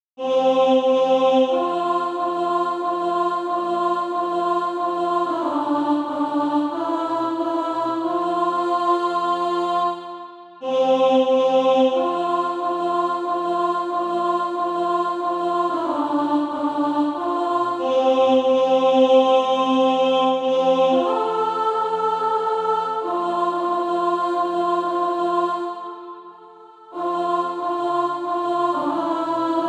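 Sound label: alt, Practise Music; Call for Workers Sheet Music (SATB)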